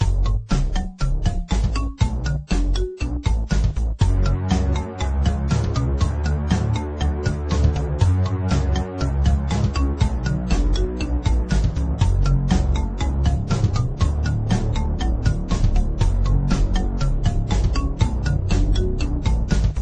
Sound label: Oldschool Bass Sound